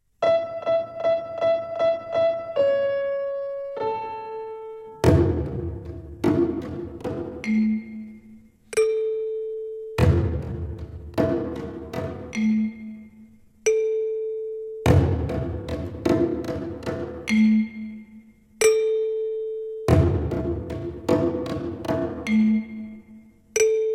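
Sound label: The Fourth Twinkle Rhythm, Violin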